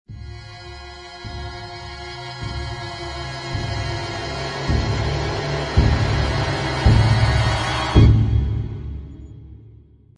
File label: SMS